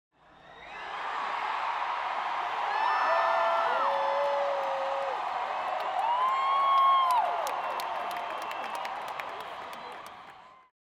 Crowd Cheering Exterior, Big Surge, Stadium, Applause; Rose Bowl Stadium; 5.1 LCRLsRsLf; 2496SoundEffects; Surround At The Show 1 5.1